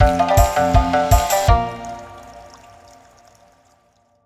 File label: win Medium; netent